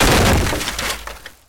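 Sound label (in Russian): небольшой негромкий взрыв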